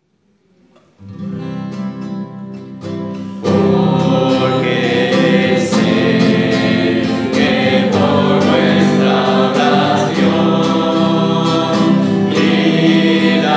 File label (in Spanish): guitarra